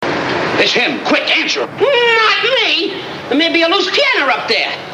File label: lose piano; Not Me Their Might Be A Lose Piano